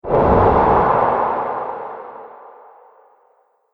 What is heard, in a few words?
Epic Sf Explode Impact; Sci Fi Sound Effects